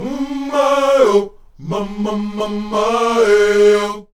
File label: UM MAY, Afro Chants